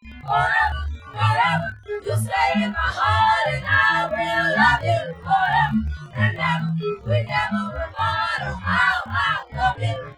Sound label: chorus 2; sound source separation